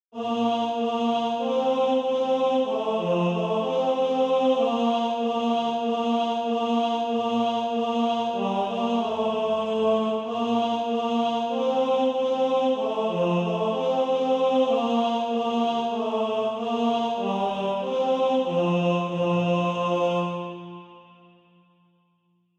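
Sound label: tenor, Practise Music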